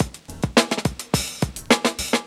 JAZLOOP; SoundFactory; DRUMLOOP

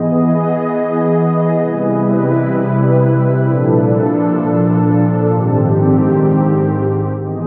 Pad, Synthesizers

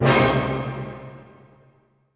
minor hit; SoundFactory

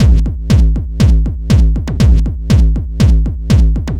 Dance Drumloop; Drums; Dance Beats

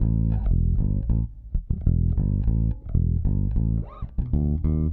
Electric Bass; Basses

